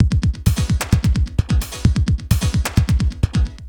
Beat, Industrial

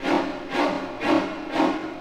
Orchestral Loops; 128 PSYCHO

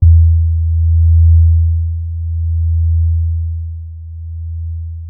E4 rap bass; Giga Samples Collection

